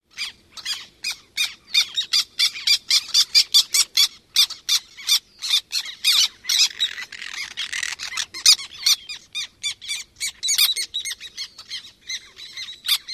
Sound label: various calls at nest